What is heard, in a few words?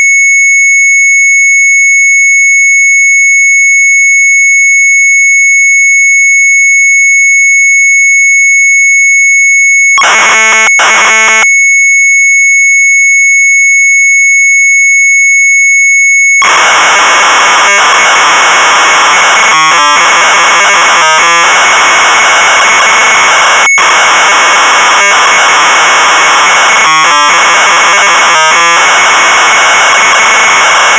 MZ800 Tape, sharp; LETTERS SYMBOL; retromelsarchive